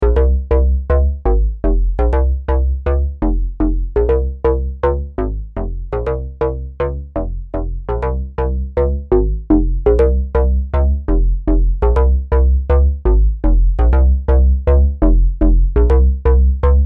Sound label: Bass; LAMM RawArtistic PhilKullmann